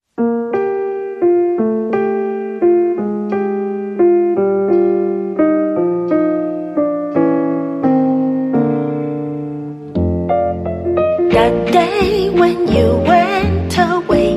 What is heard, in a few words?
Old Style Sound